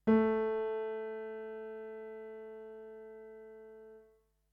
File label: tonic